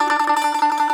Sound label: BAGLAMA T0ZL; E-MU Producer Series Vol. 7 – Old World Instruments